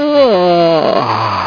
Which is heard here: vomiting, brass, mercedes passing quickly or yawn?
yawn